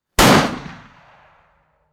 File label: single m4 carbine gunshot